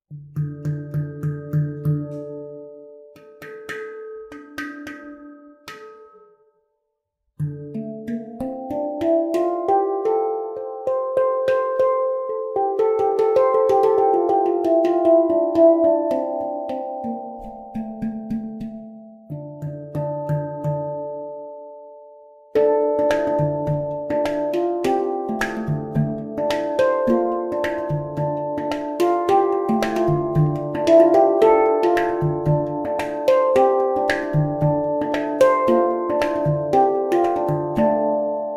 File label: handpan 10 notes d minor; supernova